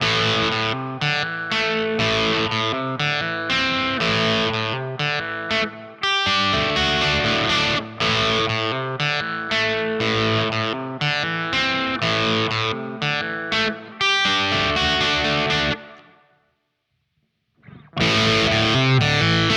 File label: EQ Low End Boost; Source Audio EQ 2